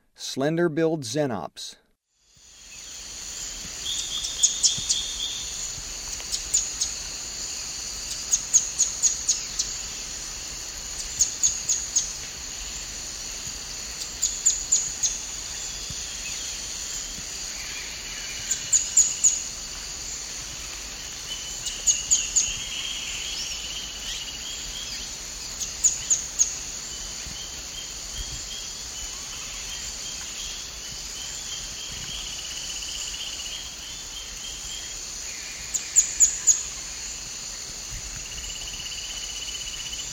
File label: Slender-billed Xenops; S. America; Eastern Lowlands